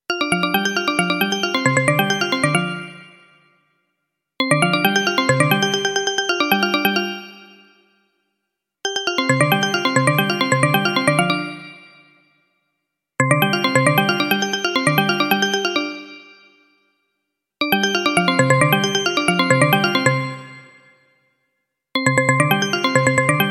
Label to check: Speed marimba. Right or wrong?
Right